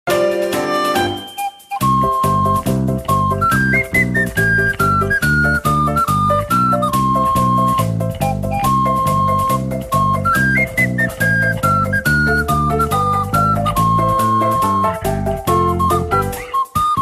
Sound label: two green windbells